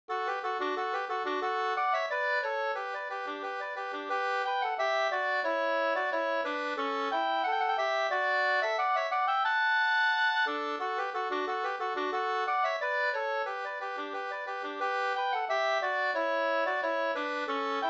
ganglat

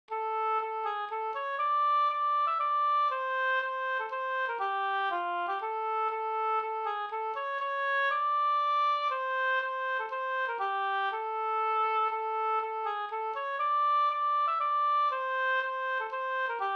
polska

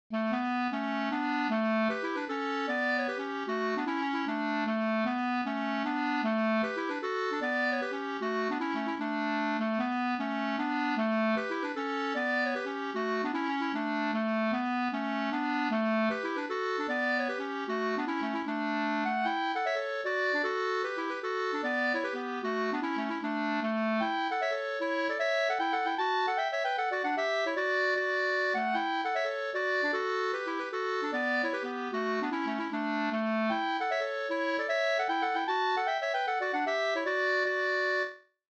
pols Rorospols; e Paula 2st

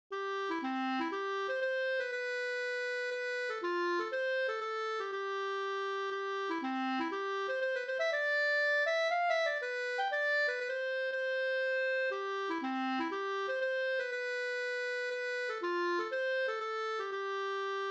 polska Bondpolska

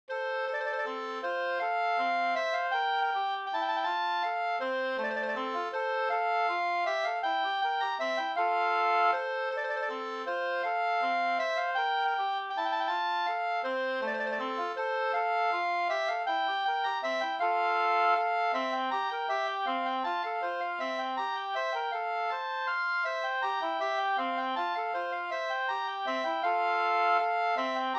vals Gammalvals 2st

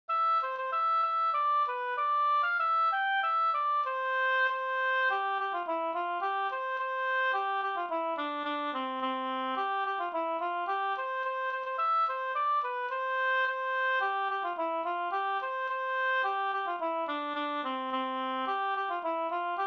folkdans; Dansa pa stra

